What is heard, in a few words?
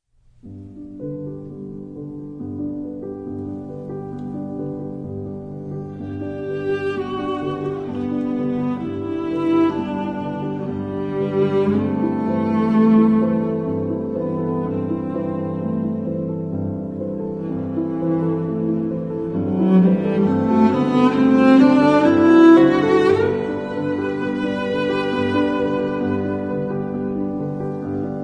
cello & piano; Camille Saint-Saens; The Swan